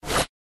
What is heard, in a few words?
SFX Interface Change